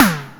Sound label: PERC FX, SYNTH PER; PERCUSSION